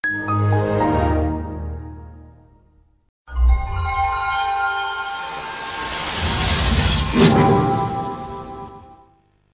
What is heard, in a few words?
windows xp shutdown sega saturn 2 startup